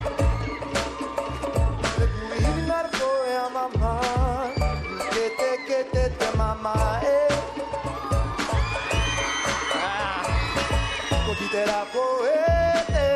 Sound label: awesome ringtone